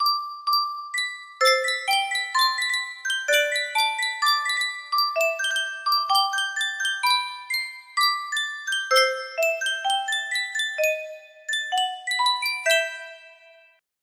yunsheng music box

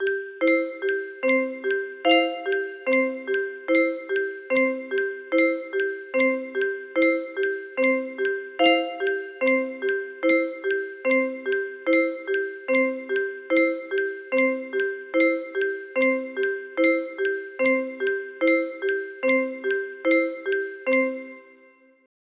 music box, music box melody

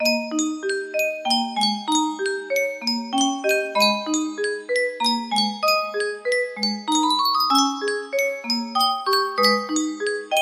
Yunsheng music box

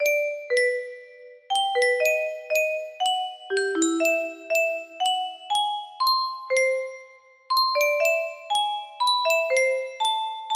F scale, music box melody

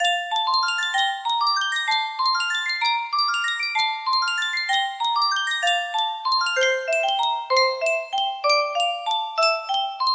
yunsheng music box, C major

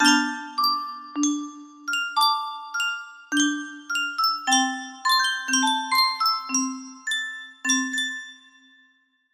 melody

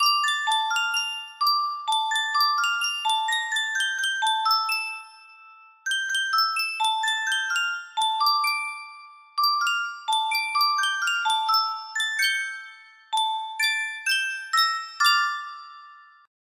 Sankyo 12 note music box

